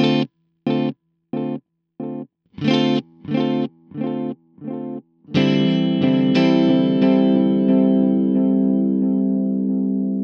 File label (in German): Coole Rhythmen mit Delay Tape Echo 90 BPM ¼